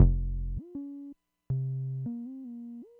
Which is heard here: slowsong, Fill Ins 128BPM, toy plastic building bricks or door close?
slowsong